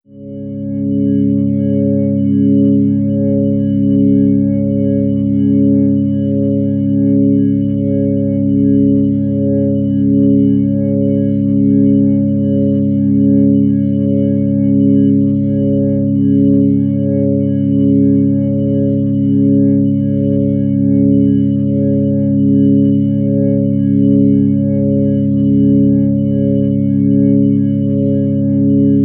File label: organ chord neutral c sharp